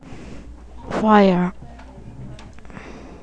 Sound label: fire